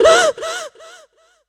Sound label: Death; Palexia; Kilta